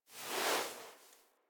Foley Idle Sit; Enemies; Kubrow; Warframe

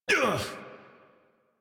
Pain, Adult; Warframe; New War; Adult Operator

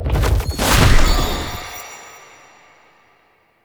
Bow Starter Rune Activate; Powers; Motes

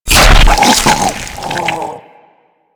Rip In Half, Flesh; Warframe

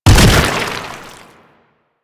Proto Infested Bodyfall Light; Enemies; Warframe

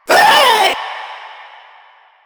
Vocal Short Attack; Enemies; Warframe; Proto Infested; Runner

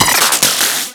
Split Laser Beams Join, Weapons; Warframe; Corpus

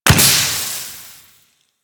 Pistol Projectile Explode; Weapons; Warframe; Tenno; Guandao

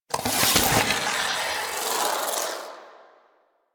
Omicrus Unequip; Warframe; Quests; The New War; Weapons

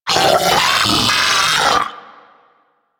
Arachnoid Vocal Player Spotted; Enemies; Vocals; Warframe; Corpus; Arachnoid Hunger

